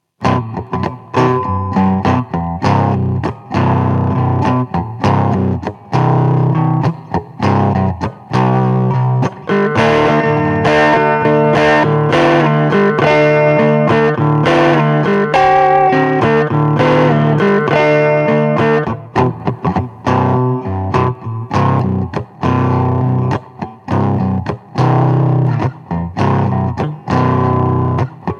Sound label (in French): Truc lourd en Si; GeAmp